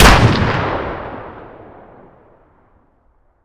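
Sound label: weapon dmr fire player atmo ext; gmod; viper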